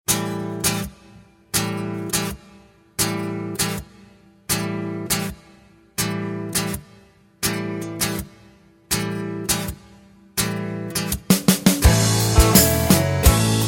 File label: A No Backing Vocals, backing tracks